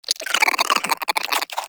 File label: Vocal Idle, Critters; Characters; Warframe; Cryptilex; Hunting Critters